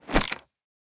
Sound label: punch gun miss; gmod; arccw uc commons attachments